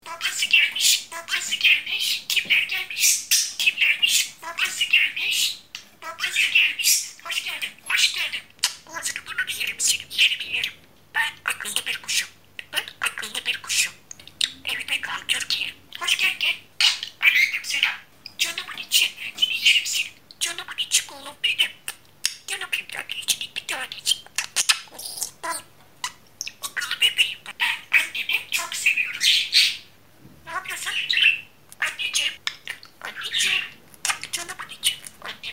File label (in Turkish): konusan muhabbet kusu sesleri